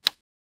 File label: cartoony quick sound, apples